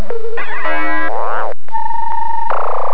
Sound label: boinken